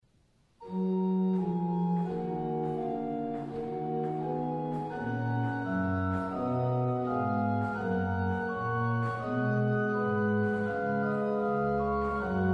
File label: dorian toccata; wir glauben all